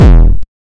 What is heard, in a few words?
Hardcore Bass